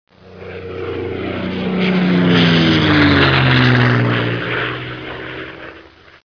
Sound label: Flyby, aircraft sounds